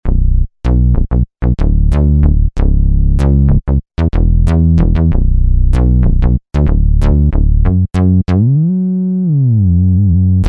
JUNO Bass, Roland V-Synth; Factory Patches

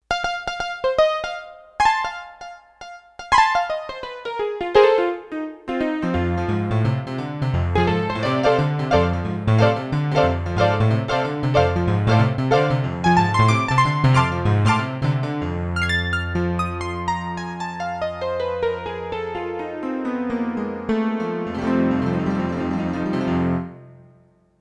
Roland D-10 Touch Piano; Factory Patches

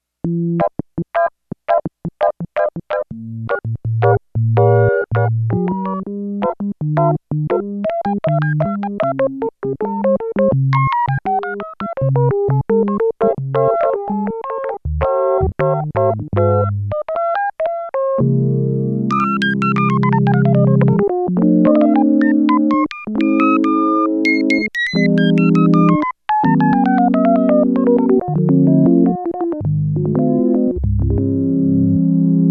Organ With Resonance

